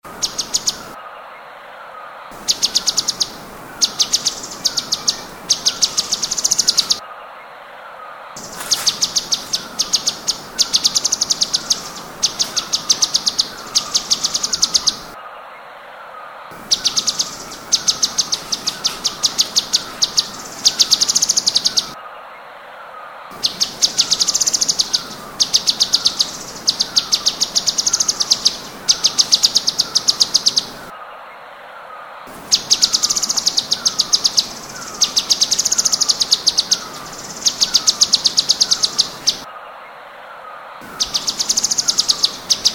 suara tembakan cucak cungkok

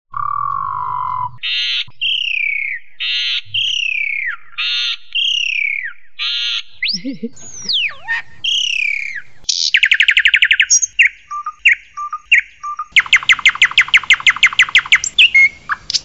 kombinasi jalak hongkong suren

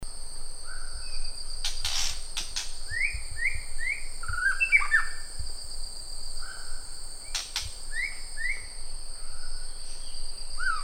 suara asli murai batu

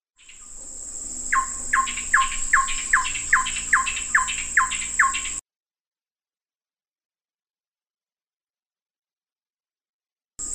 suara call song burung ciungair coreng kalimantan

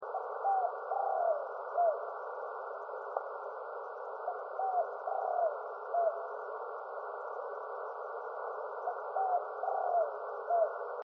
suara tekukur

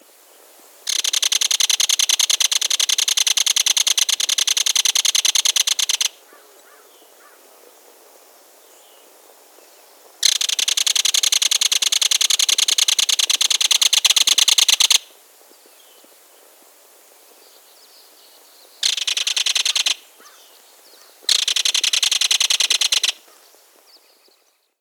suara belted kingfisher

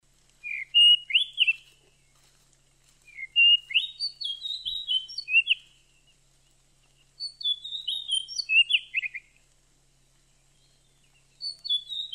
suara kacer pikat

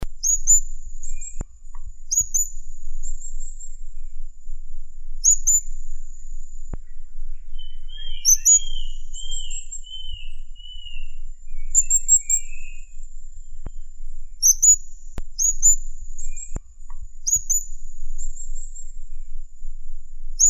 serindit sulawesi

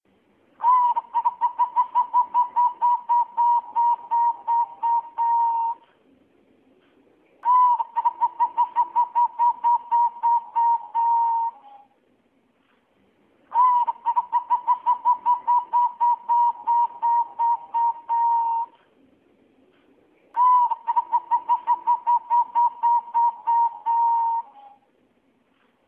suara perkutut betina

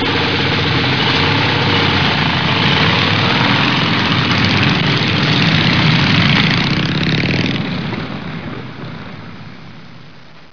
suara mobil

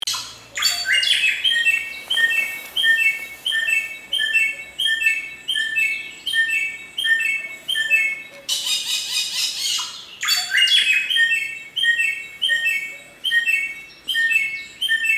suara burung samyong di hutan

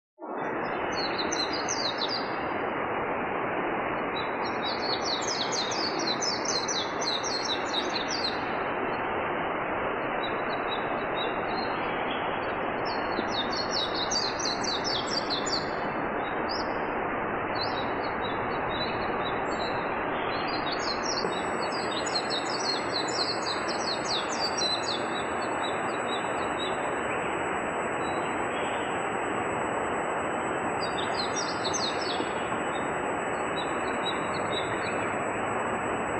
peci liar ngalas

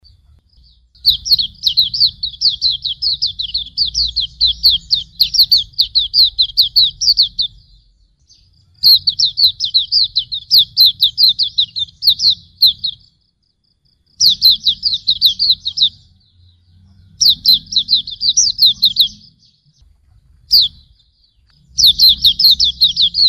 suara pleci auri

